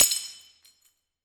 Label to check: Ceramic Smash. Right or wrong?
Right